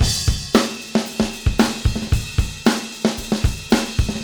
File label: Beat Loops; Zero-G; Monster Beats